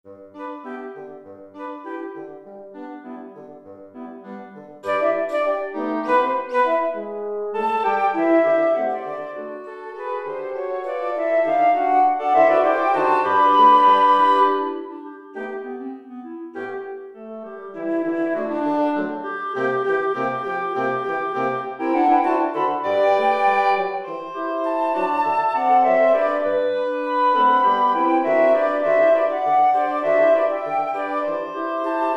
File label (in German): blaeserquintett